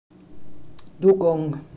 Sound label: Dugong, marine mammals